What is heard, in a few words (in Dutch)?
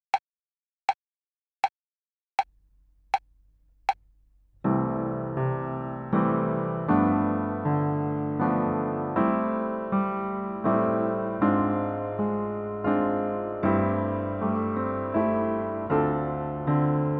Speel de toonladder van C